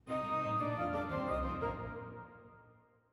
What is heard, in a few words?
ORCH REDUC piano; Beethoven; Symph3